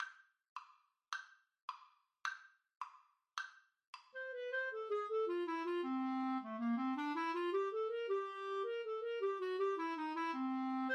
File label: mexican hat dance Clarinet Duet